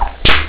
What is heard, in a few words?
whipcrack